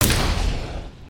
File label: Death Pop Apart; foley